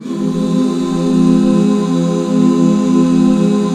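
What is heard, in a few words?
HAL F minor left, Back Chords; Sound & Vision - Gigapack II CD 1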